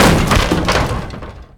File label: barrel break; Fate The Cursed King; ITEMS